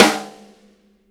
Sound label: SD, korg drums; Korg Electribe; ESX-1; electribrary-3